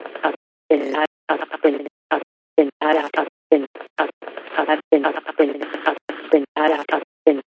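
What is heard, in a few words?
vox glitch loop; Ze Franks Pain Pack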